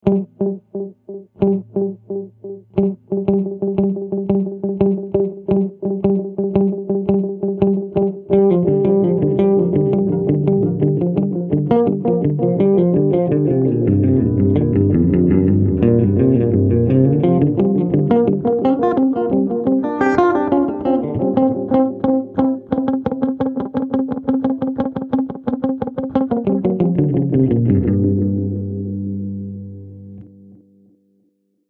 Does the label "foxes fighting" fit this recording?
No